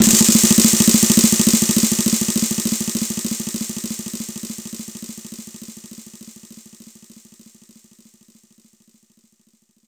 Sn roll; Giga Samples Collection; Johnnys Rap Tool Kit

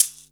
maracas, Yamaha Motif ES drum machine; 300 Drum Machines; Yamaha Motif ES-Series

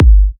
G, kicks; markus hakala kicks

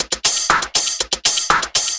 Combo Hat

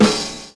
OLD SNARE; Univers Sons

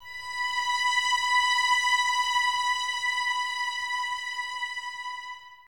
VS B 5, 11 Violins, Top; Miroslav Vitous; String Ensembles

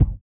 GLASS; Spectrasonics; Metamorphosis; GLASSBABY

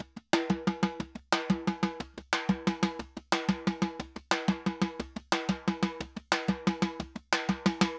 Timba, Festa Latina, Drums & Percussion; Best Service; Festa Latina VOL-1

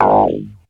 tuners

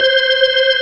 B3 C 5-4

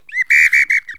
BIRD, bird call; Greytsounds VOL-1; BIRD CALL FX

